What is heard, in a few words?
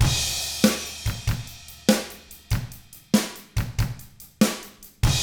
ROCK 6/8, Drums; ILIO; Double Platinum Drums 1